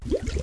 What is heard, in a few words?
few bubbles, aquarium